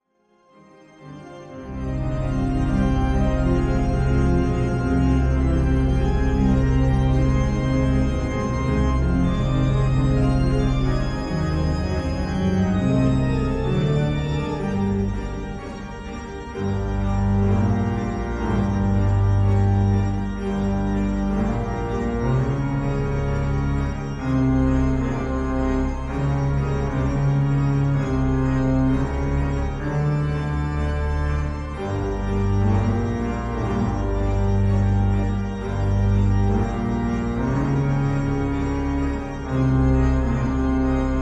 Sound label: toccata